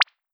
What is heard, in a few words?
cute pop